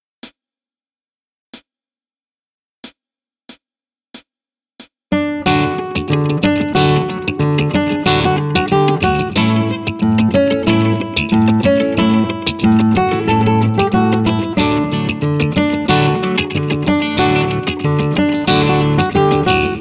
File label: shoo fly square dance usa